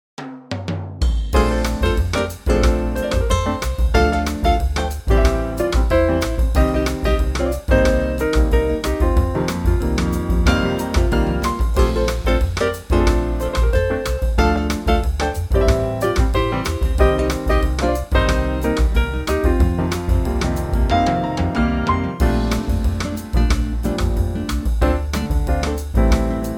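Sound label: B flat quartet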